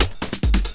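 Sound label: loop snare drum, 140 BPM, Drum & Bass; Ueberschall; Drum & Bass Resonance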